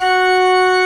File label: CHURCH OR F#4 R; Best Service; Advanced Media Trax Modern Composer